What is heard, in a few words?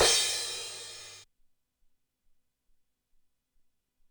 Cymcrash, ELKA X1000